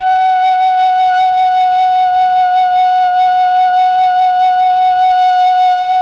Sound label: MELLOTROF